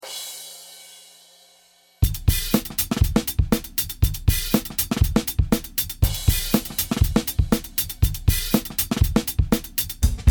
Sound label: Long Crash Cymbal